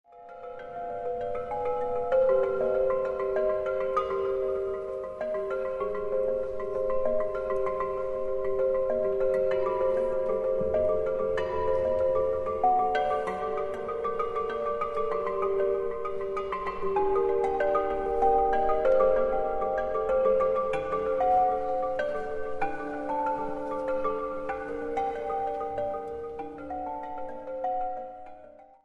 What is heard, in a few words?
Bach Praeludium